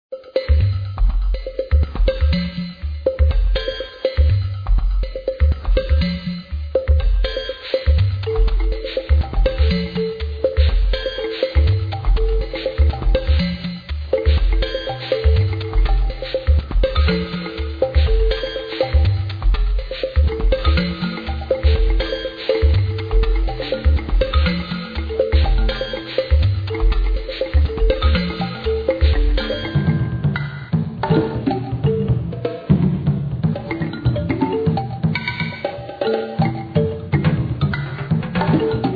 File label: music Town